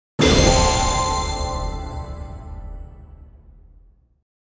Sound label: Casino slot machine jackpot small win